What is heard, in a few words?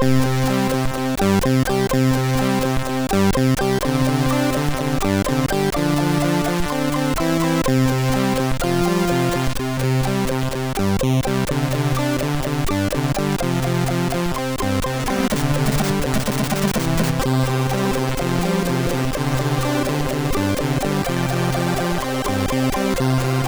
Funky, MOS6581R2